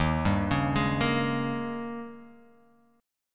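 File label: E flat 11th E flat, down, Guitar, Open F; arpeggiated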